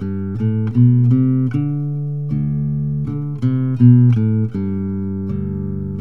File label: bass theme